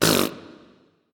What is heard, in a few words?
tongue